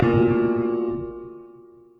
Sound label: piano Bb2